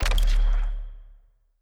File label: roulette winner